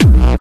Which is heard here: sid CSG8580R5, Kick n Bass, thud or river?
Kick n Bass